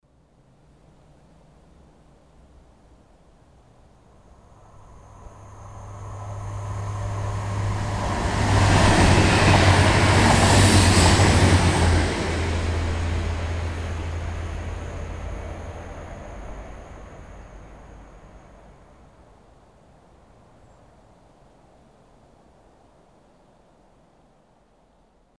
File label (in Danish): ice forbi, tog